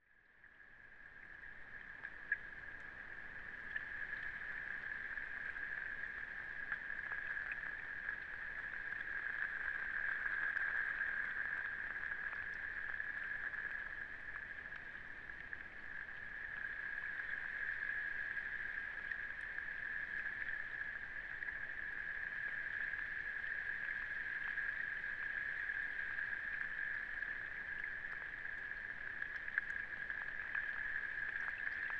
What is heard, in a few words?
duckweed pond light rain